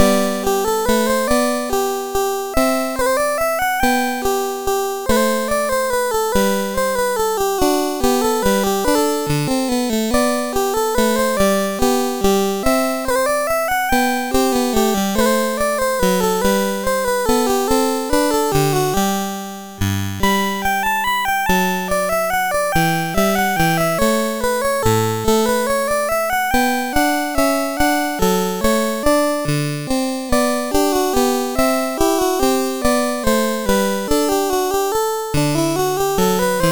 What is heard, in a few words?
Minuet in G, CSG8580R5; sid